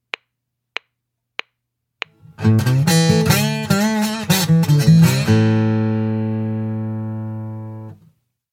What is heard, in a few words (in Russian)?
Слайд гитара